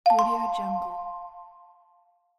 new sms text message

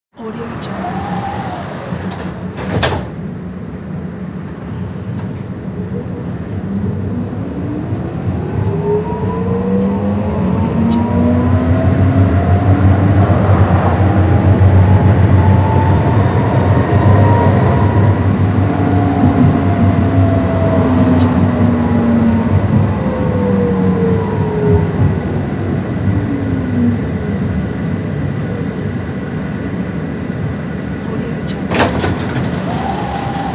tram ride

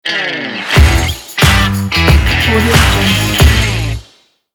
podcast intro ident

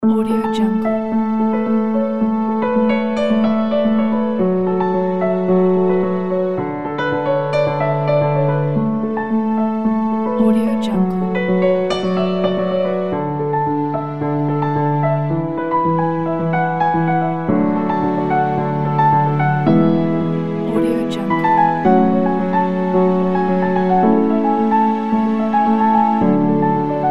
uplifting piano

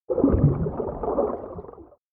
fish movement midlow end burst bubbles small; prosoundfx

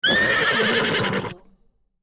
horse neigh

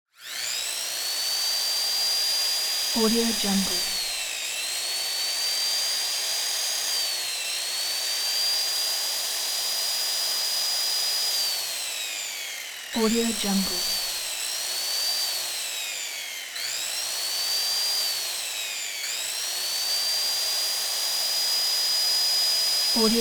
circular saw turn on and rev; prosoundfx

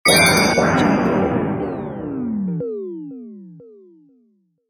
cartoon ui fail; jbobstudio

